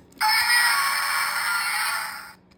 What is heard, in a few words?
godzilla punch; mykaiju daily august